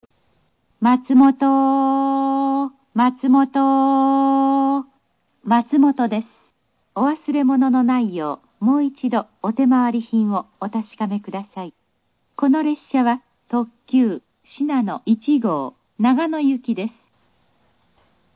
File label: track stopping; matsumoto; shinano 1; higashinihon